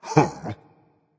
confused, berserker; cuchulainn

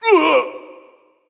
grunt hit

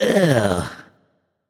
nv ugh; smite; voicepack; ganesha

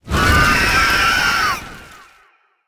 phoenix screech; smite; npc

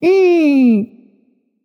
tongue light; smite; voicepack; hel

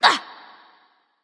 grunt jump; smite; terra

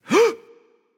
breath; smite; susano

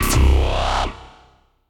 Defeat Crash; smite; raijin

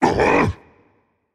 surprised rage; chaac

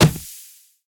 hit; smite; pele

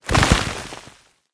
dirt; smite; god scylla

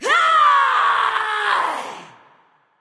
battle cry; smite; voicepack

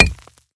shield clap; Smite; Cabrakan

